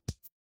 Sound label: ui app open